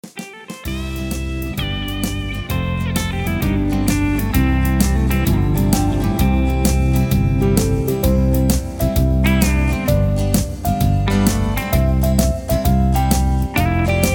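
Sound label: Instrumental with Leads without background vocals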